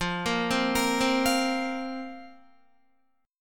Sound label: F Suspended 4th, Guitar; arpeggiated; chordshape